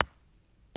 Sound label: golf ball hit cartpath; ProStroke Golf World Tour 2007